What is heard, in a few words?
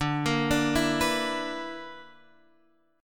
B-Minor Major 11th